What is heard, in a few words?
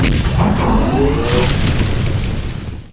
harvester deposit spice; Emperor - Battle for Dune